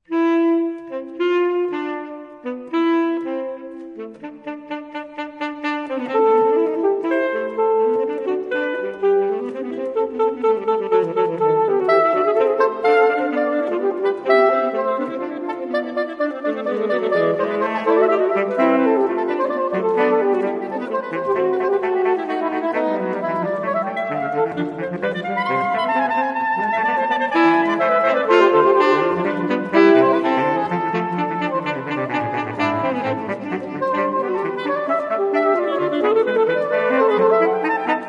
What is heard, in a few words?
sax